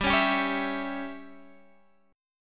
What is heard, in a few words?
C sharp Diminished G